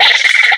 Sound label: box monster chitter